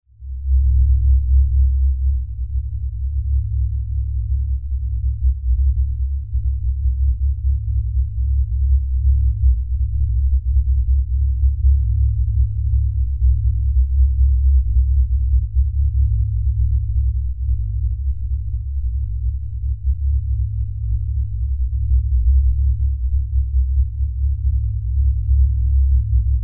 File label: Lfe Drone Low Bass Rumble; Drones; Blastwave